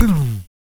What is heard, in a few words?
PERC, MALE PERC